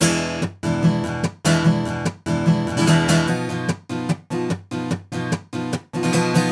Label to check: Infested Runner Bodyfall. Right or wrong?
Wrong